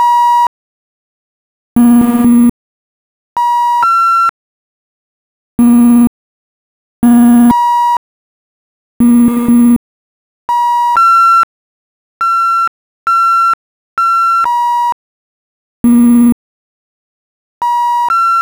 sinewave

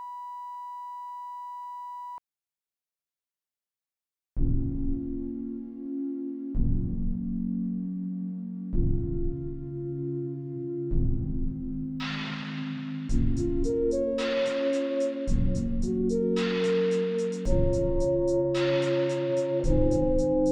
Single Sine Wave

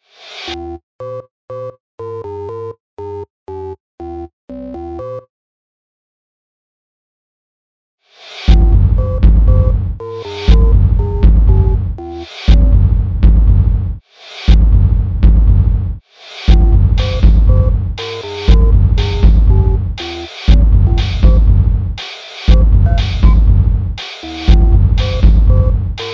8 Bit Boss

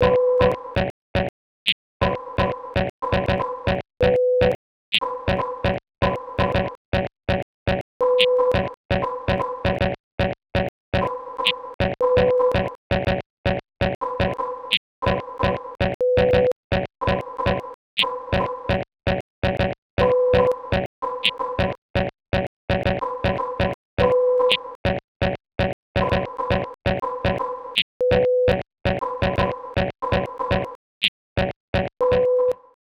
Sine Wave

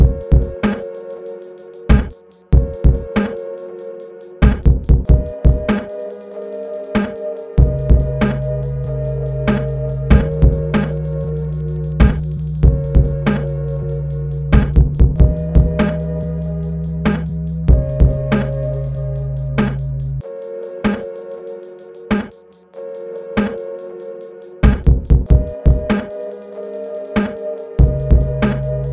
1k sine wave

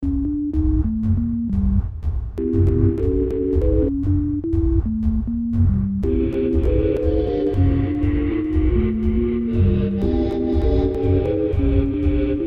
Sine Wave

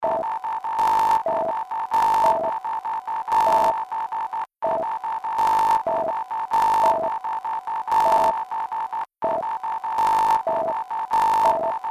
sine

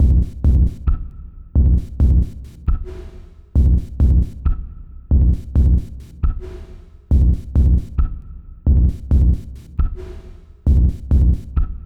SINE WAV

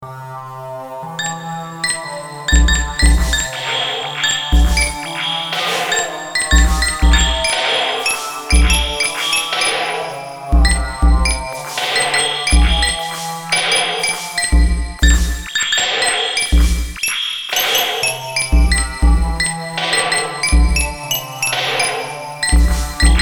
Sine Wave

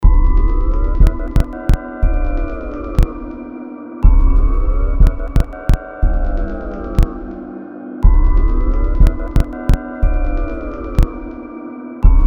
1K Tone

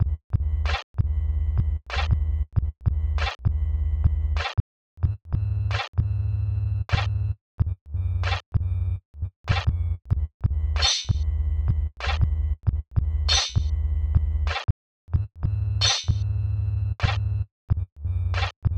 Beats

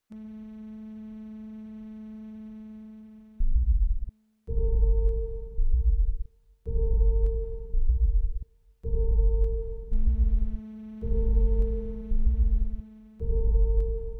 Sinewave

